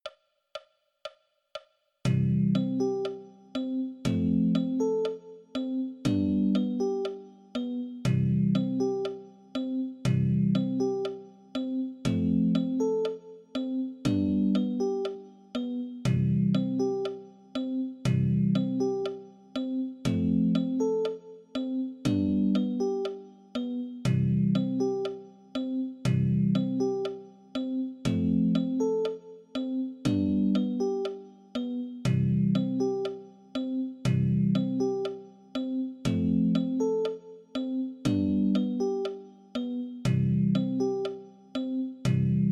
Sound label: uke strum 4 120